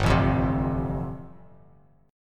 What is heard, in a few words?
G 7th Suspended 2nd Suspended 4th, Bass Standard; G7sus2sus4 strummed